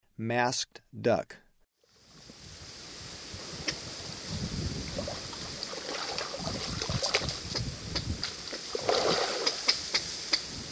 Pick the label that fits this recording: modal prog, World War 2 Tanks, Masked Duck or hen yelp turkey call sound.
Masked Duck